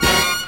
STAB; ZERO-G; Datafile

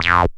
SYN TON, ARP SYNTH; E-Lab; X Static Goldmine 2; akai

